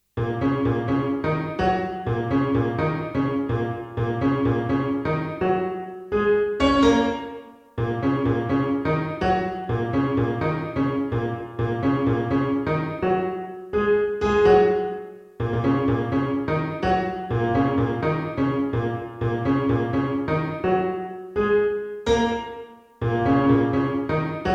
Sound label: piano pop